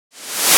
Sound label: FX Loop C m 123